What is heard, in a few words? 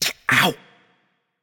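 nonverbal ouch; voicepack; smite; merlin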